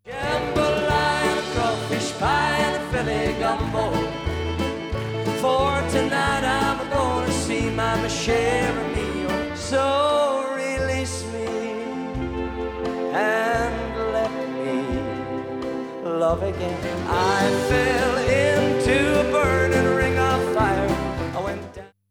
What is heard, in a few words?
music bed